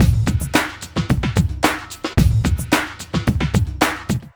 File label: Club Tip, R&B Drums